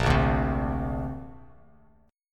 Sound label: Bb Major Add 9th, Bass Standard; strummed